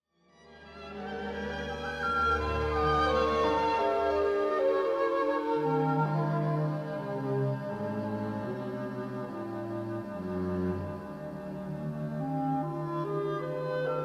Cadenza

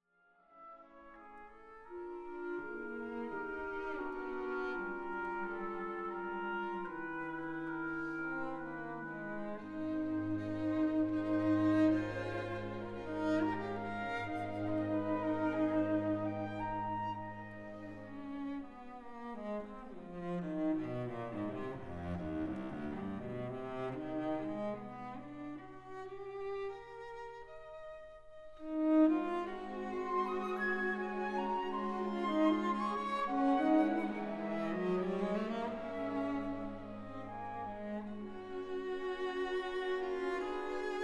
Cello Rumination

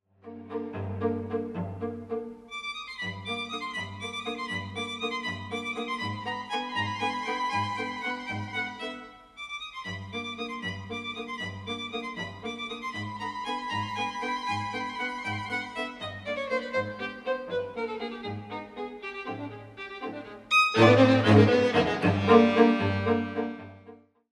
Waltz

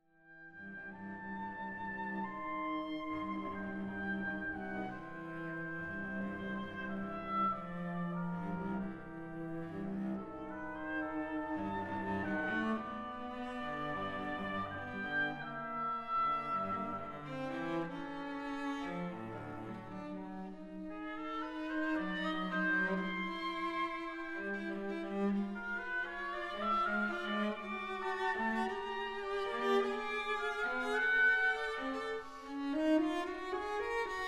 Cello Oboe Duet

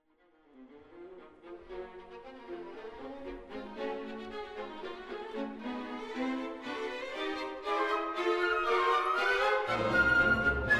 Cello Central Tutti

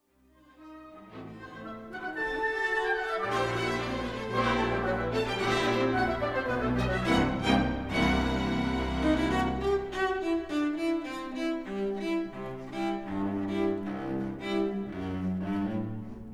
Cello Mvt 3 End

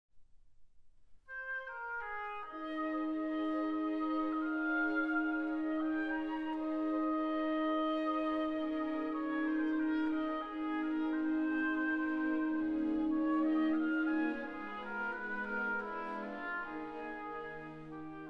Cello Mvt 1 Opening

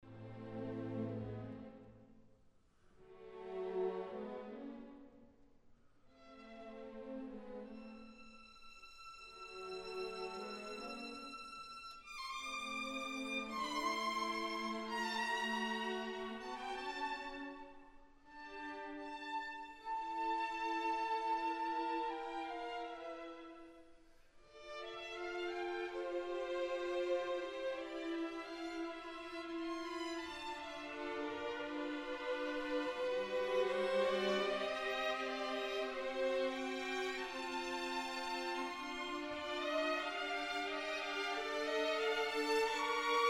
Flutes

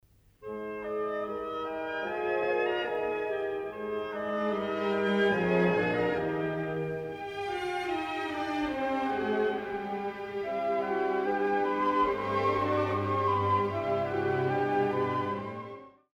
Finale Oboe; Schumann2

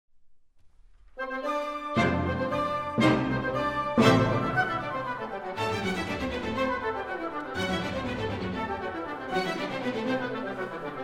Cello Mvt 3 Opening Gruff

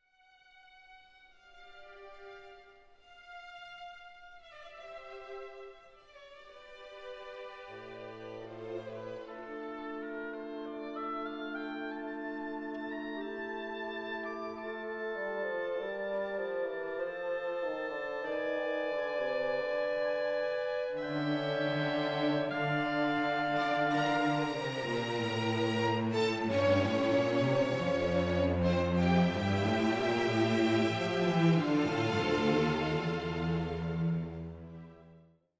oboes and clarinets

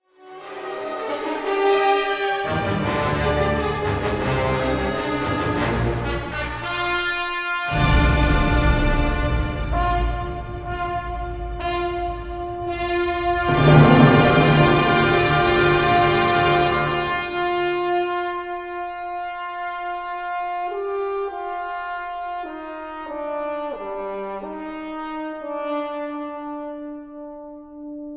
Alphorns; Mahler

